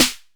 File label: trap snare; Hiphop